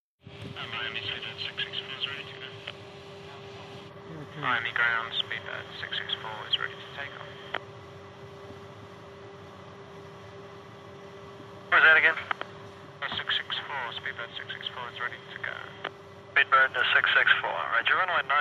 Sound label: 707 Cockpit Ground C, interior perspective